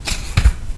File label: book